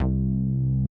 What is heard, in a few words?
bass C1, grime, 140bpm; Zero-G Sound Sense Grime Scene; Construction Kits; Its Primetime